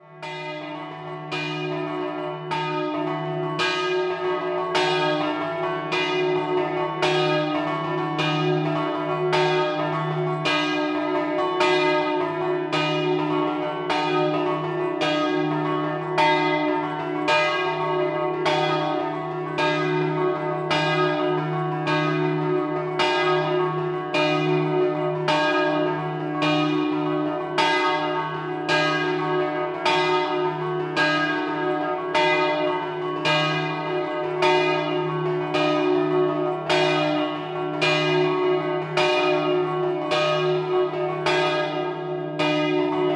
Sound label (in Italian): Flumignano Scampanottata, campane